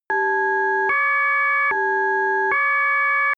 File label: siren emergency car